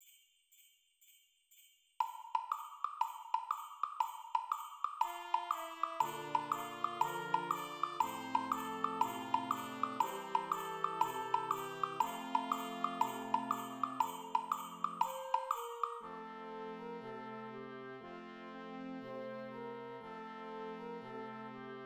perc; Jul

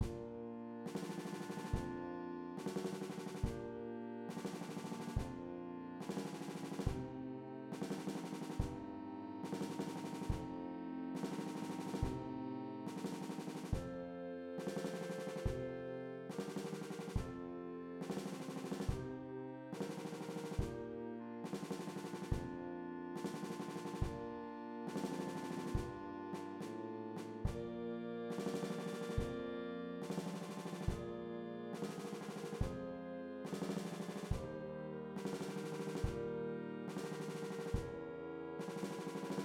perc

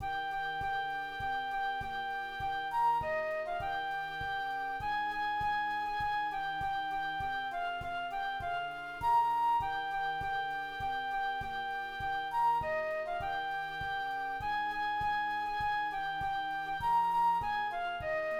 flute; jul

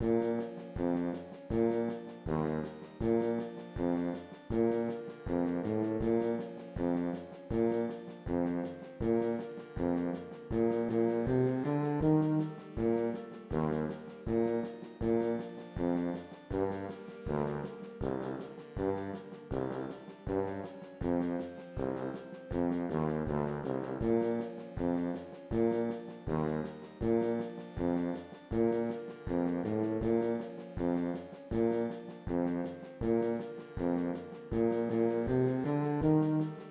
tuba

